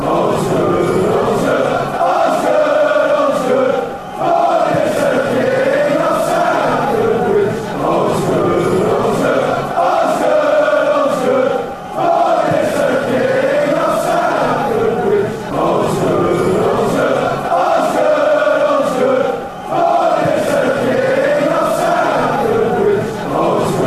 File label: chorus only, fanchants; king stamford bridge